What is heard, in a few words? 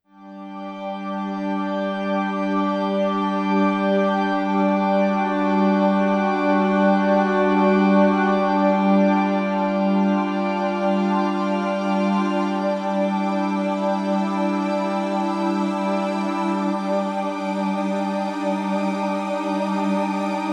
wide digi strings